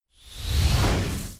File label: thought route